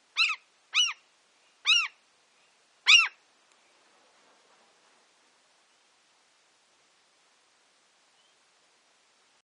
goeland cendre

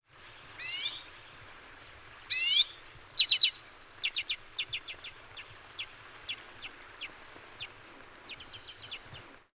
sizerin flamme

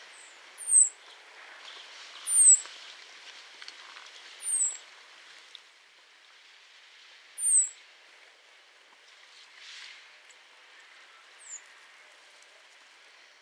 bruant zizi

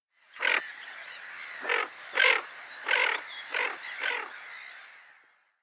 fuligule milouin

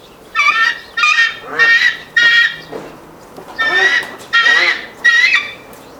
pygargue a queue blanche

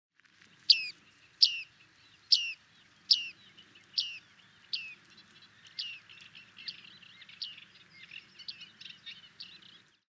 panure a moustaches

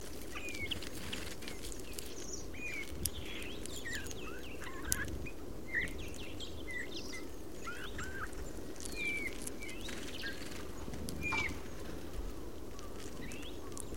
bouvreuil pivoine

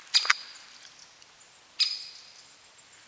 pic epeiche

